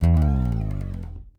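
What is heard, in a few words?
Yamaha slide, Bass; Masterbits CLIMAX 7; PATITUCCI BS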